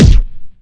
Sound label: plasma; weapons